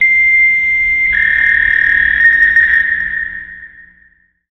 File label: dial up internet; SoundsCrate